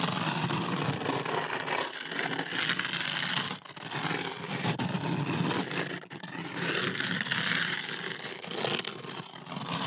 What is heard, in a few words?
ice slide loop; teardown ps5 windows xbox series xs gamerip 2022